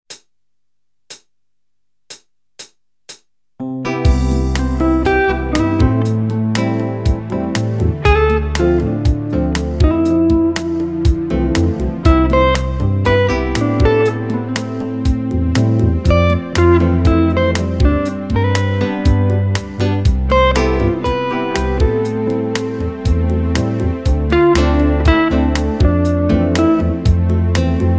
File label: Using Scales, guitar